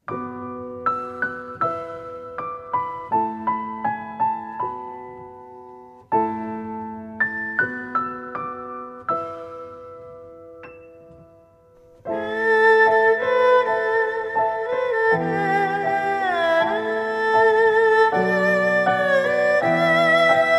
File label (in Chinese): 伤感的背景音乐